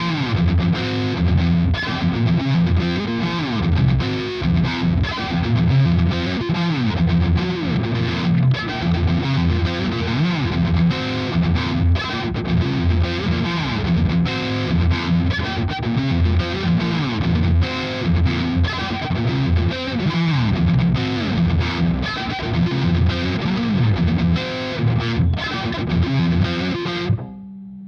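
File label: Both pickups, guitar; Schecter Omen Elite 7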